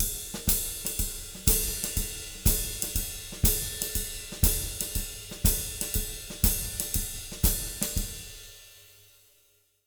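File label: 120 SHUFFLE, Jazz Latin Drumloops; USB Soundscan vol.08; AKAI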